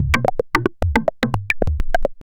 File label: thick rhythmic percussion, synthesizer